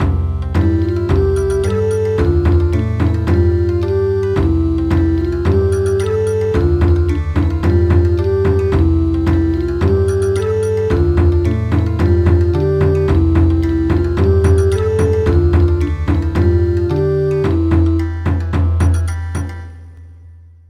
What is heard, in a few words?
Bon Odori; El virolet